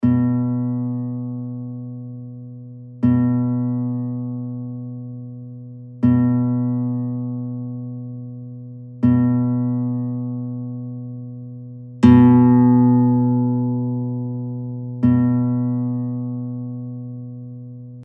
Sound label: Hitara 7-str G-strij B2; 5-та струна – сі, B; Класичний”, або звичайний стрій бандурки